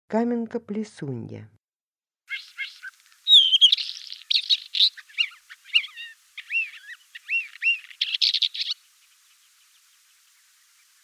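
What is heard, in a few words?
isabelline wheatear voice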